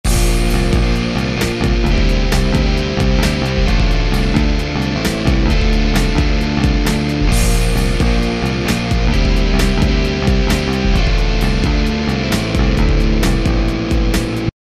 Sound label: Sunday rock